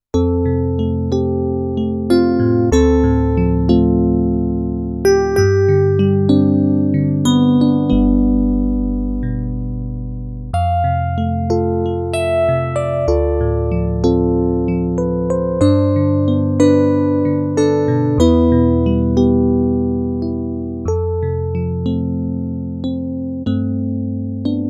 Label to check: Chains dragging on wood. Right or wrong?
Wrong